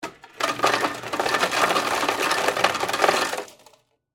Ice Machine Dispense, Hotel; Miscellaneous Bar Sound Effects

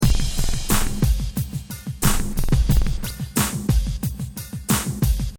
Hip Hop Drum Loop 90 Bpm Straight With Crash; Pneumatic; Royalty Free Drum Loops; LynneMusic

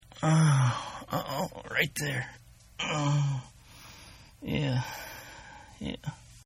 Moaning Male; Groaning; Sound Effects:Voice

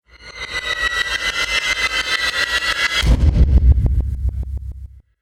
Movie Impact Stutter; Miscellaneous Impact Sound Effects

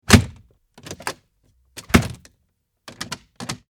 Cupboard Door Open and Close; Cabinet Doors

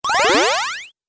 8 Bit Style Transform; Video Game Sound Effects

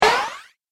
Cartoon Rising Hit; Cartoon Sound Design Sound Effects

